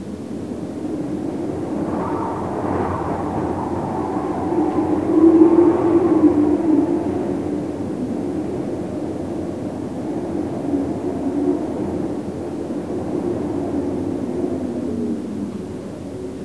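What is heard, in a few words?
wind inside park loop, ambience; cstrike; trailer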